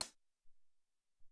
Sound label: Sqk Hat, Alesis SR-18